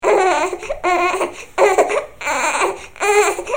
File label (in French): Bébé, bruitage; Bébés